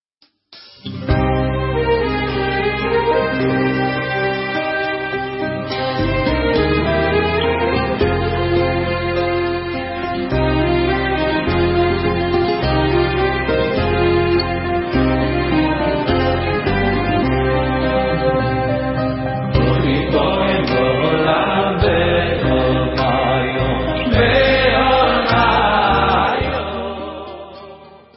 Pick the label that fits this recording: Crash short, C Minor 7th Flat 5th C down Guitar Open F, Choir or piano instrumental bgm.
Choir